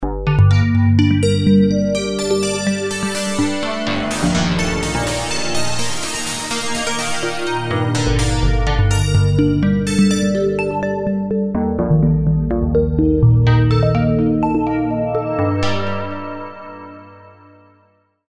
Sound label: FM Bell